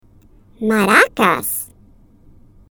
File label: MARACAS